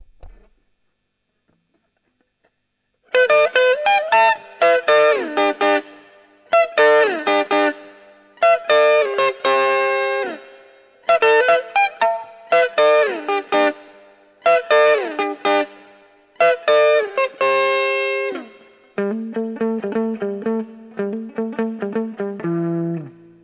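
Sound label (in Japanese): クランチ, 真空管ギターアンプ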